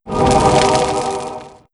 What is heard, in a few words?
Lock Chime; Fire Resist Shrine